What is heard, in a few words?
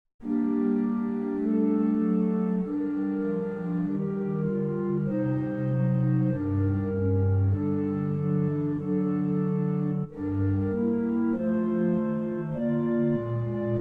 Church Organ